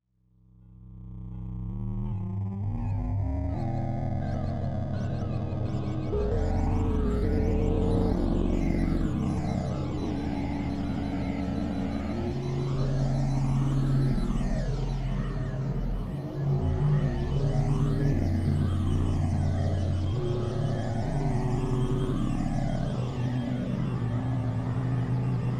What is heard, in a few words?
Sci-Fi Soundtrack